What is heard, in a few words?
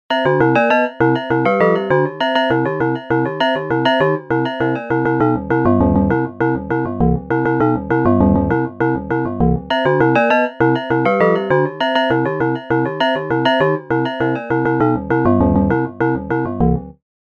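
string bass, Chromatic hits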